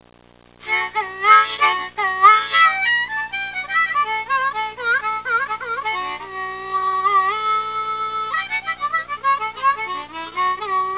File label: harp